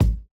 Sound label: kick